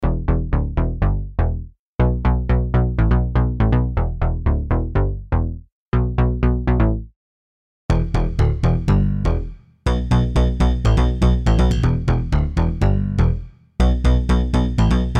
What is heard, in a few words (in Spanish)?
Bajo ejemplo